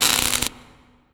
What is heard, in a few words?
elec spark welding; Modern Warfare